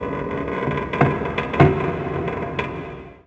Ship Creak

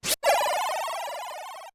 Sword Combined; Both sword sounds combined

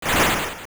Bee Swarm; Veran's [Bee form] swarm attack (also Ramrock hovering, Twinrova's fire shot, and more); Zelda Oracle

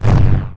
Teleport Vanish; Sheik Teleporting (sounds)

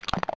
Big Deku Baba Bite; zelda; OOT

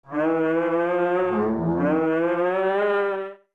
Sing

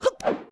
Link Roll; Link rolling; The Wind Waker; Zelda

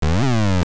Walrus; The lazy walrus "singing"

